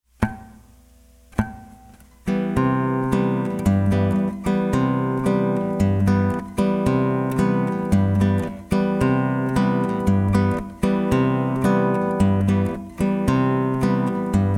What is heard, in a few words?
wrong bossa; bossa nova brazilian rhythms